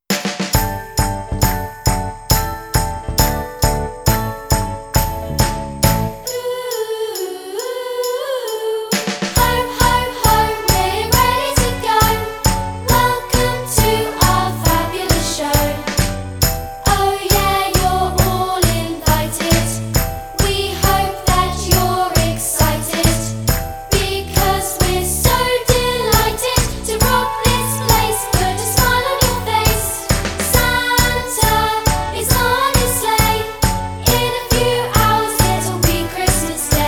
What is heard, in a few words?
with vocals